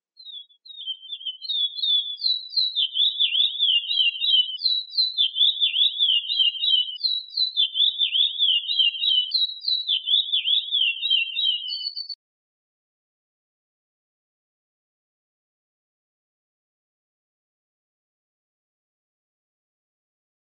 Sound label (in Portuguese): canto do curio